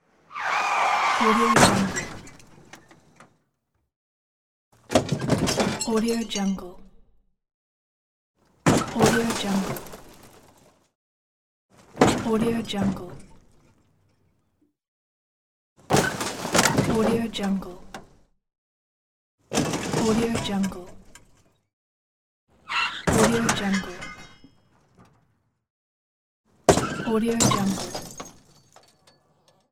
accident sounds; billionsounds

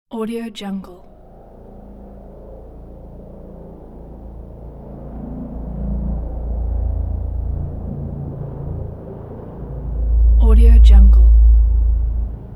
helicopter flyover building interior sound

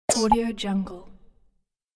itching select mark

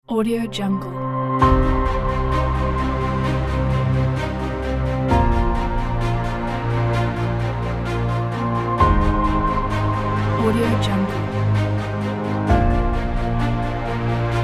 cinematic trailer; silenceart

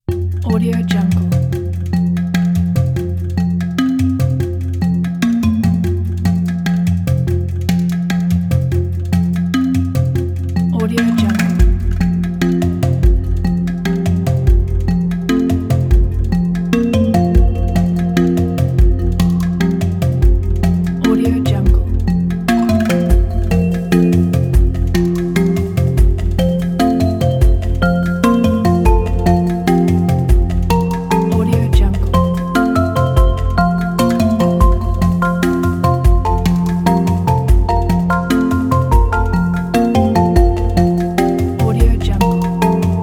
documentary travel exotic

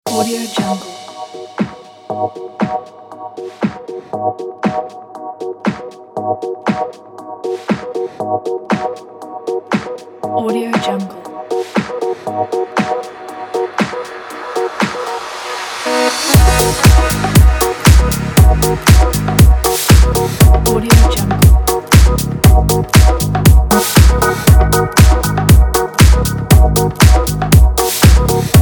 luxury glamour

